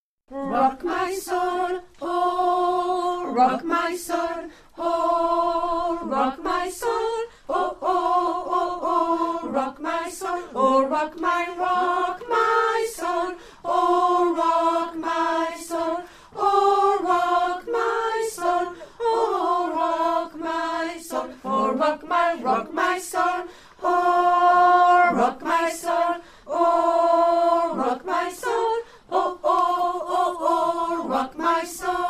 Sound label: Rock my soul, Alto